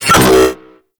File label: Thermal Shock Cast; Warframe; Runner